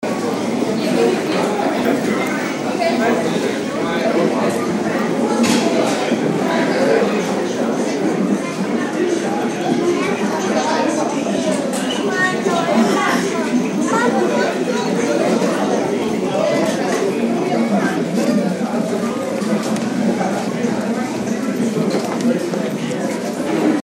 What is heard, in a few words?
burger restaurant